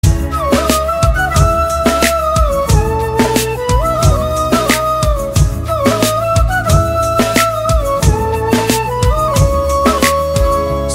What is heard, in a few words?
Flute Ringtone